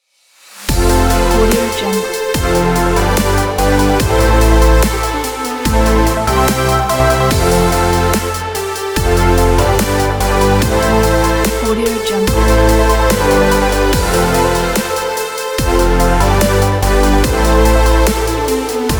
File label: melodic dubstep logo